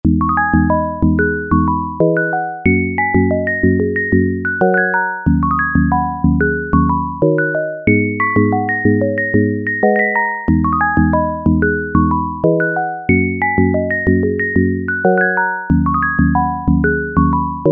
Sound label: Loop Sine